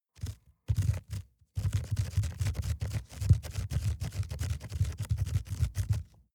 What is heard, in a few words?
rat run trample fickle